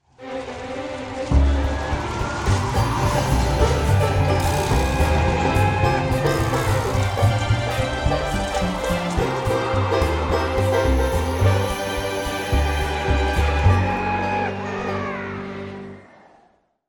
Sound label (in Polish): muzyka w grach dziki zachód